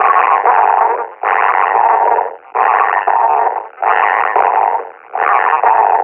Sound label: panting, combine officer; Half Life Alyx; Half Life Model Pack